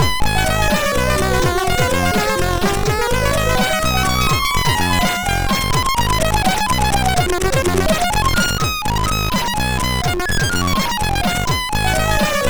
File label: sid MOS6581R3